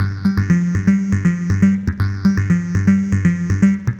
Moog Passes, 120 G, modular; modular madness; 120bpm

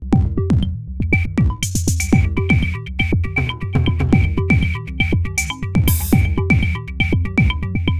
Drums Strange

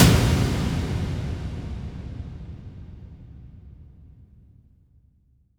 Impacts, Effects

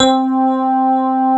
many roads C4, SD1 keys; East West - Global Sounds

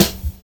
LOW SOUND; Univers Sons

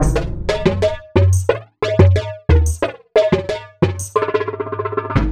kit 090bpm; Zero-G; Downbeat Dance Grooves

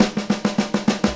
FILL

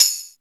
TAMBRINE

